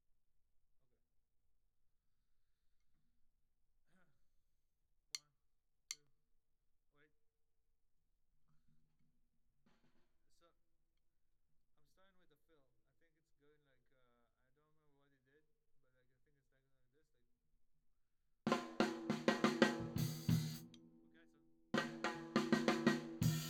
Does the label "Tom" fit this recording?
Yes